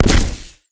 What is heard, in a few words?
mag shield